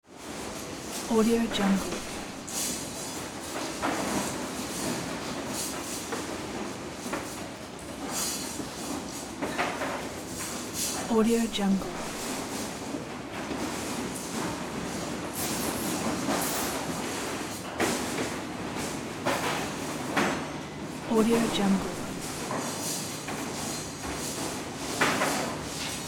construction site with metal being moved; hollywoodedge

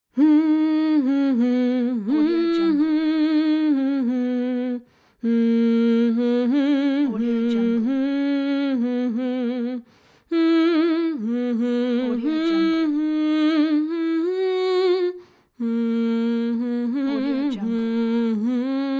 woman humming

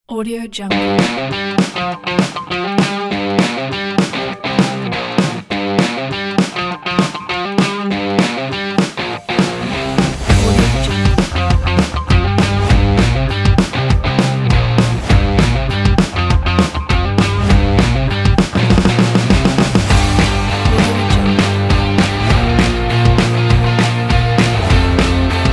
rock indie upbeat and energy

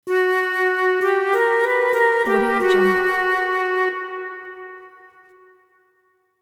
mysterious flute

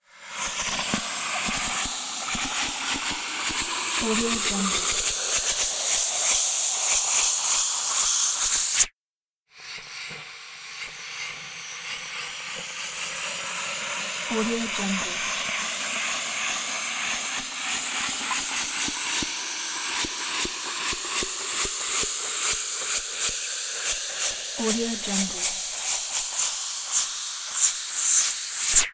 hose upward suction

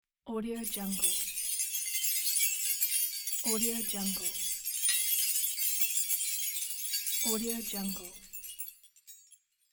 sleigh bells

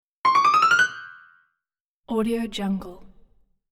fast ascending comedy piano accent

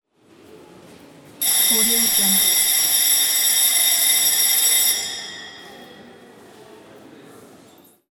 school bell

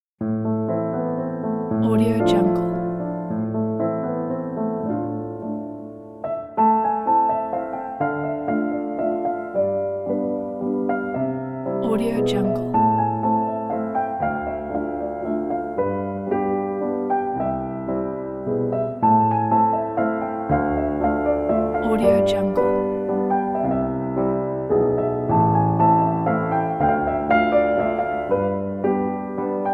romantic piano waltz in a minor; simonarmitagemusic

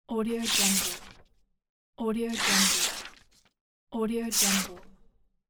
paper rip selection

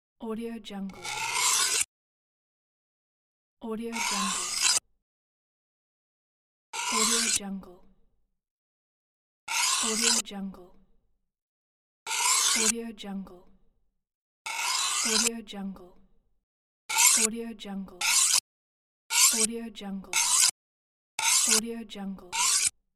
knives sharpen; blackriverphonogram

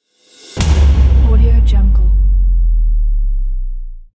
cinematic impact; music-fish

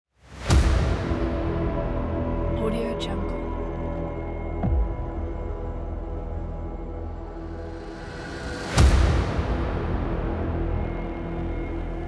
dark trailer

